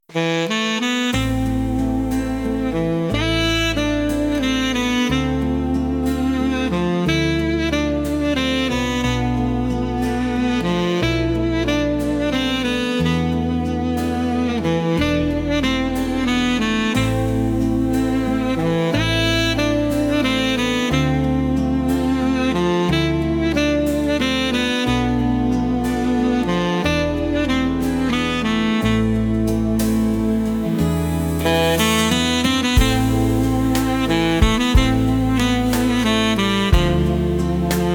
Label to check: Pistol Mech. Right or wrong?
Wrong